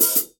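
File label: open hi hat, Real Drum Kit, FUNK KIT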